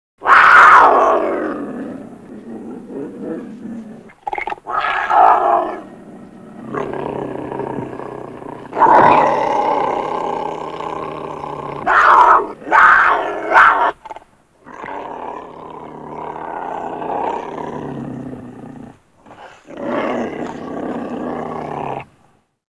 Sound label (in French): cougar